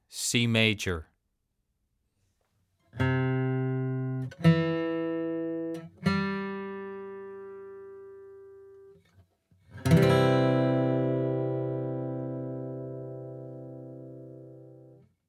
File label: Major Chord